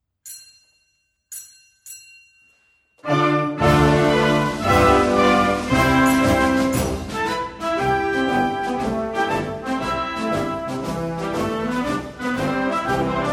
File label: Marsch, fanfare